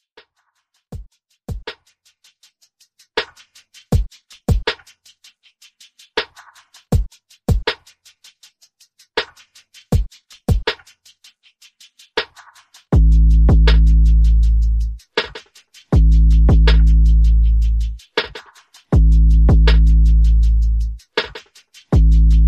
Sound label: top40 mixshow intro 80 bpm